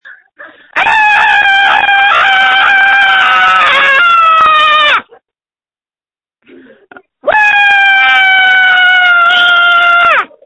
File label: screams